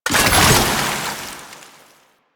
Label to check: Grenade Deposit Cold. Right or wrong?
Right